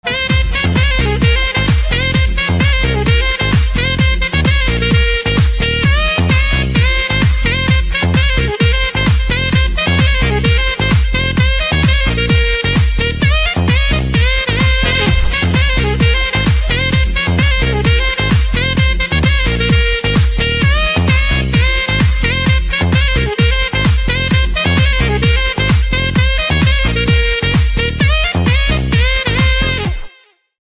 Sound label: Saxophone